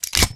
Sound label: Sniper Rifle Mag In; Requests Studio; UT3